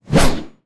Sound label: spear